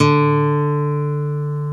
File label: NYLON D 2, GUITAR; AKAI S-Series CD-ROM Sound Library VOL-8